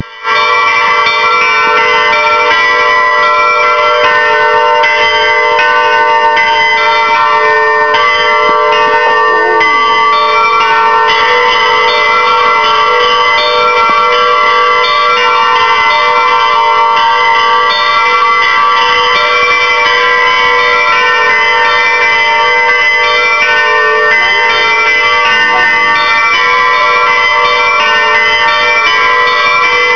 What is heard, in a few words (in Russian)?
zvon; valdai